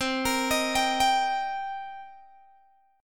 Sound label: C Minor 7th, Guitar, Open G; down; chord shape